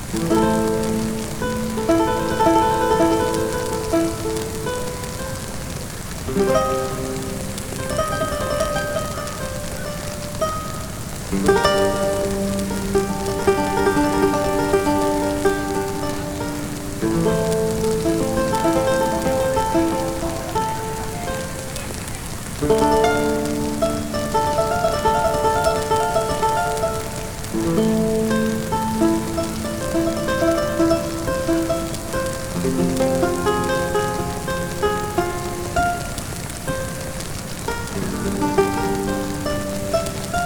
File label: Ambient; Xecution